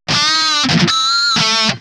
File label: metal